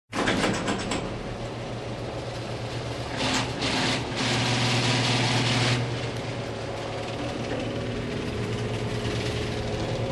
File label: Garage electric door opens, closes